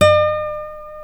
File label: E 5 nylon, Guitars